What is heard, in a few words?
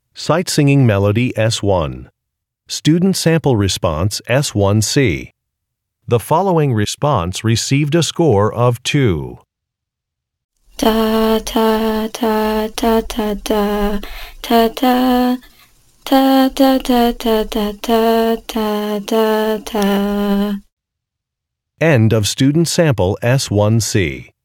music sample; Sight-Singing